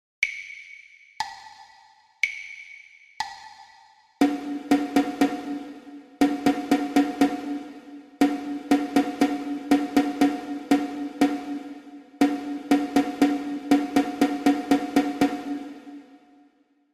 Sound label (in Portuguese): percepcao ditado ritmico